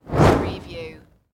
Torch Pass By Whoosh

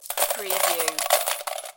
Slot Machine Win - Coins Falling Into Payout Slot

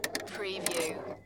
Pinball sound, ball rolls on rail

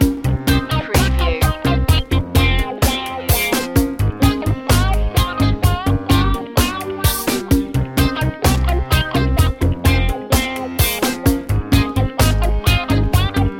reggae thing loop

